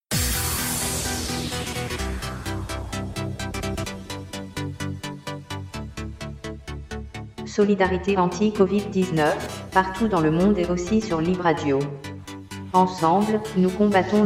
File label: Jingle